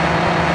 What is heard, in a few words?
FAN SMALL; lander